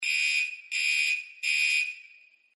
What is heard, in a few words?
camera spotted; Danger Mouse - Ultimate